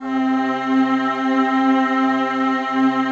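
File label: PAD, atmospheric pads; Best Service; XX Large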